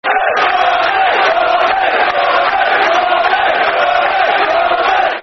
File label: Blue Army, Football Chants (Soccer Songs); Portsmouth